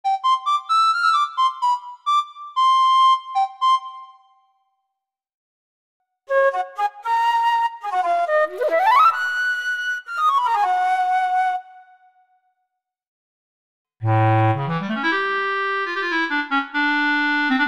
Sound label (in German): Holzblaeser